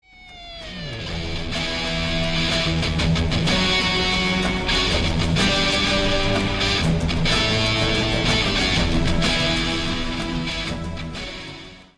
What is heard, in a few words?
guitar; halo 2